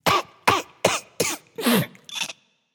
clear throat; smite; xingtian

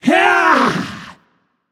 yay; smite; ahpuch

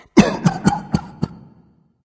clear throat dragon; fafnir

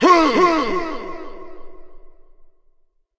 shout vox; smite; god odin

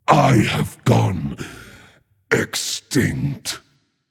death; cernunnos

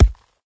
punch ground; Smite; Cabrakan

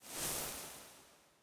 fire; smite; hades

